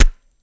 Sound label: Celery Snap Low End; gore stabs & eat